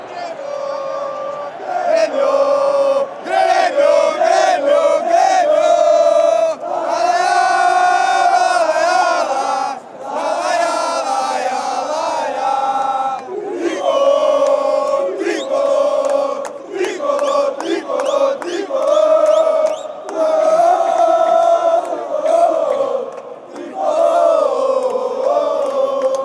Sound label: gremio tricolor fanchants